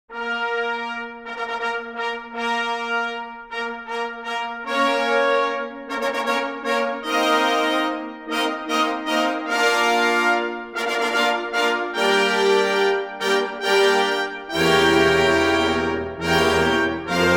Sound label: Arrival Fanfare (Long Drum Roll)